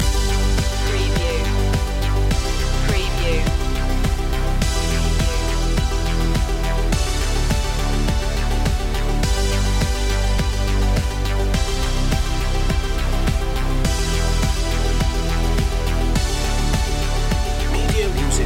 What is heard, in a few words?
sparkle loop